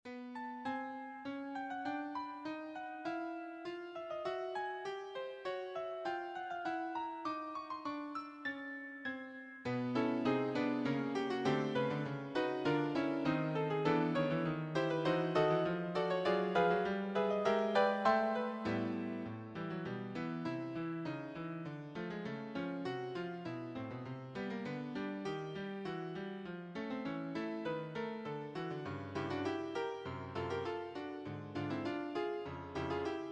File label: piano